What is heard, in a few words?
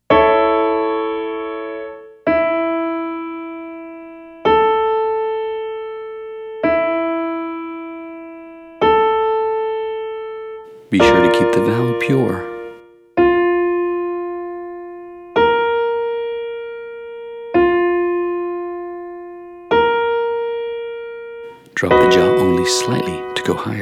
Exercise 8 Ladies